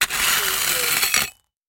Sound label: Rake Gravel Metal